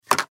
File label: Telephone Pick Up; Electronics; Office; Telephones; Blastwave